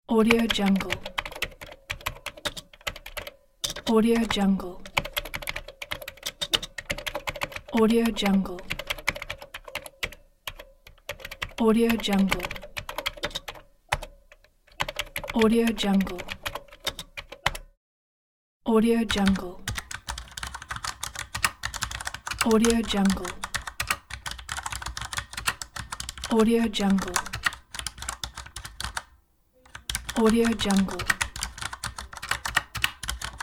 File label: keyboard typing sounds